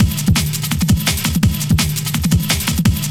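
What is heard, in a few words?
drum loop; X-Static Goldmine 3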